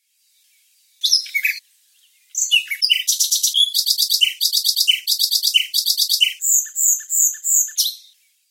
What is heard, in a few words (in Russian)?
щебет птиц смс